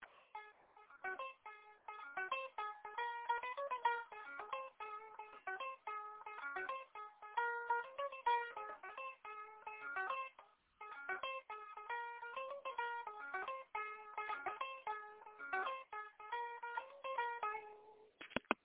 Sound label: strange high pitched noodling